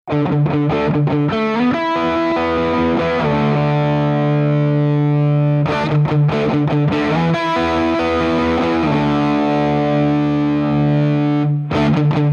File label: G-2 tone pot sweep